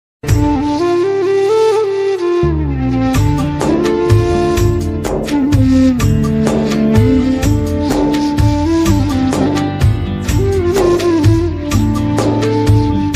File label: flute bgm ringtone telugu christian ringtones; jesus